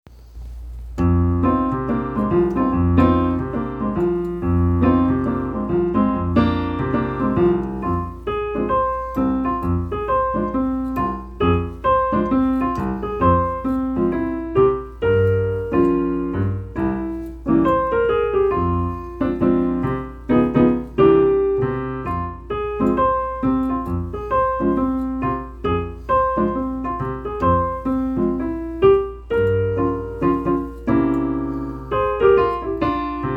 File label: Piano Jazz